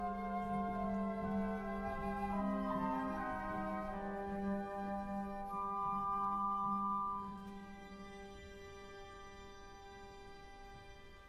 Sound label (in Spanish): CONTRALTOS; REPERTORIO ÓPERA; Danza Igor; Danzas / Polovtsianas Principe Igor; Danza 1 / C15-C45